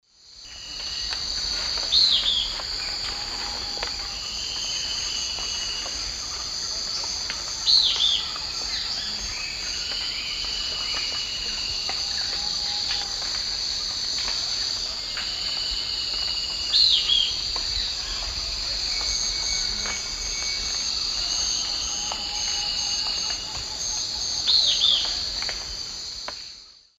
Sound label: Lathrotriccus euleri, song or call; S. America; Eastern Lowlands